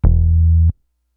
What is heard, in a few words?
Synth oneshot Flat E, Synth Bass; Synth Bass One Shots; Slate Digital BASSHOLE Sample Pack